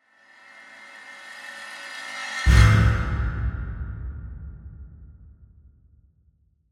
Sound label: Metallic Scrape, Production, Element, Metallic, Scrape, To, Hit, Slow; Warped